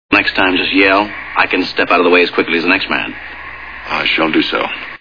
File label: yell